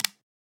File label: button click